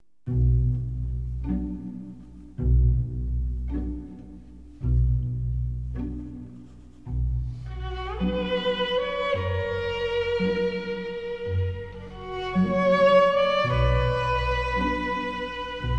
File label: viol; sviridov